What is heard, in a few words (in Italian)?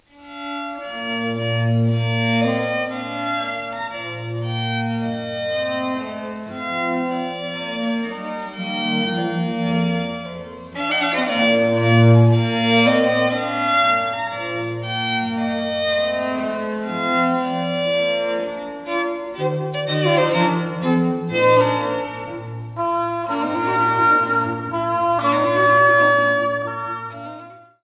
quartetto